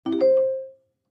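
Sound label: Clavichord Short Arpeggio; keyboards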